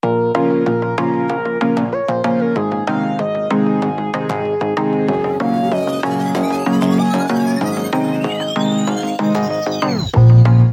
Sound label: Ringtone